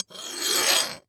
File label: Weapon Sword Being Sharpened; AdobeCC